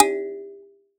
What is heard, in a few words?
Finger, Prepared Kit; Battery 3 Sample Kits